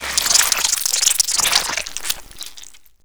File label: hornet poison cloud